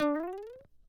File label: Elephant Mario Jump High